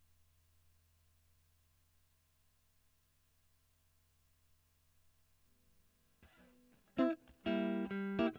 E.GTR Gefell

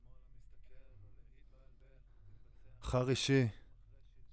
Voc OD

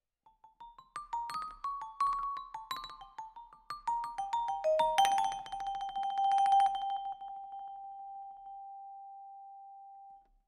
Vibra Close

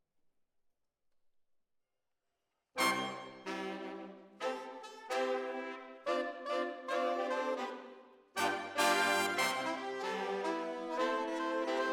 ORTF, Jazz for piano

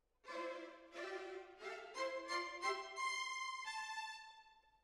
Decca, Jazz for piano

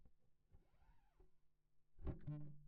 Bass

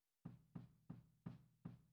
HiHat

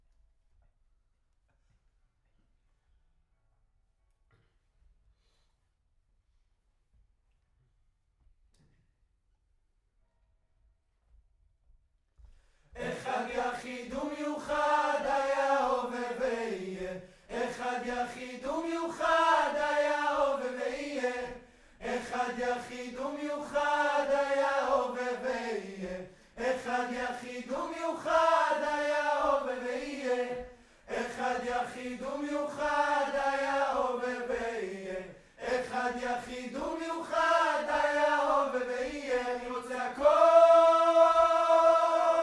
Choir Far

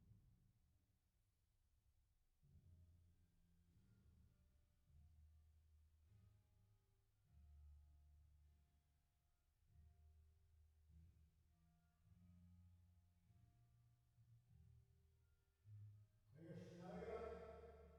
Piano 480

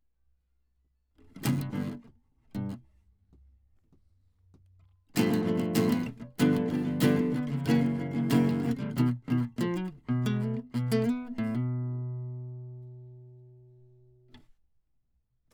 Classic Guitar

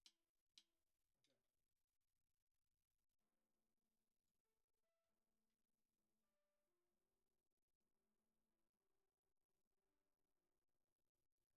SNR Bot

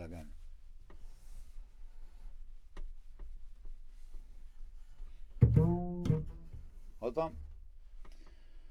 Bass Bot

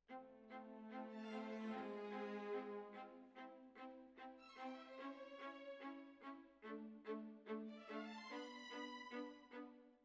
Violas Over

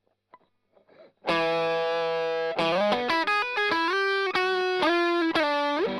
Strum Line

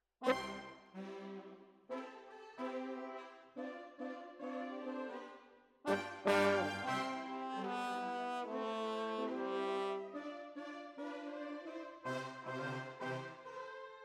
Horns

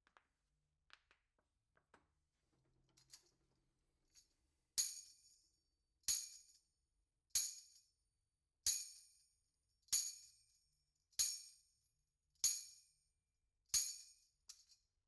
Tambourine

